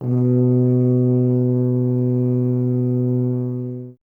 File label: tuba; Miroslav Vitous; Solo Instruments VOL-2